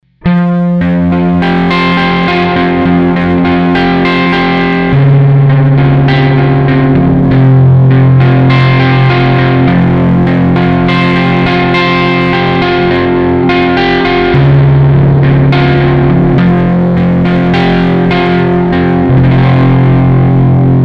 modern clean boost

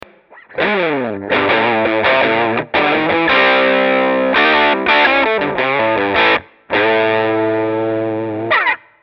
Vox Crunch

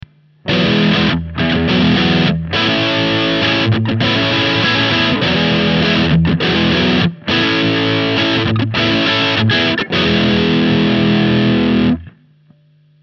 British Crunch

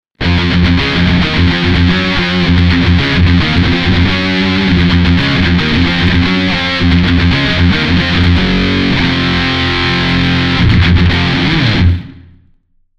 british lead boost

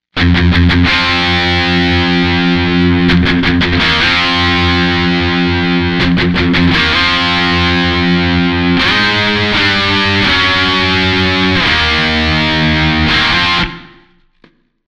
british crunch boost

